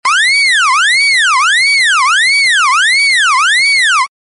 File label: alarm tone